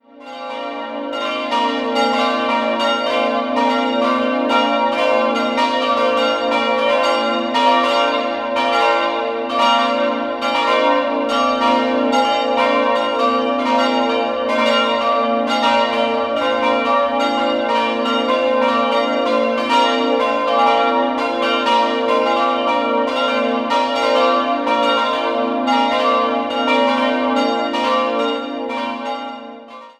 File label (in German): Nürnberg Boxdorf ev, Glocken